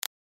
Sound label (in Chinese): 黑莓四倍速